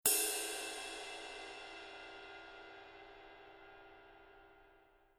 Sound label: bow